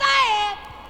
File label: Vox